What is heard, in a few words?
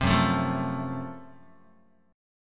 F sharp Minor Major 7th Flat 5th, Guitar; strummed